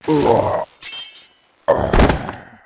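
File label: death, monster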